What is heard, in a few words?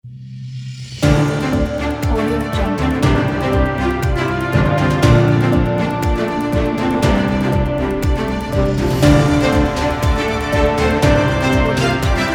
news set ident